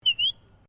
Bird Cockatoo Sulpha Whistle, Australian Cockatoos; Birds (Sound Effects:Animals)